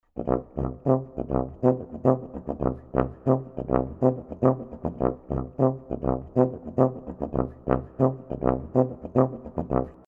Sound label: Funk tuba; Grundton, Oktave und Ghost Notes; Grooves for jazz tuba